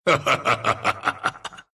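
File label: laugh, Russian; arc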